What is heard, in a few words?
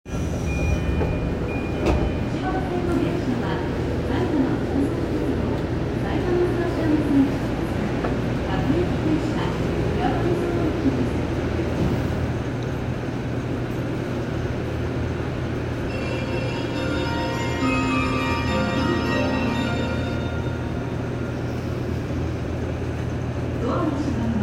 train sounds; 南北線志茂北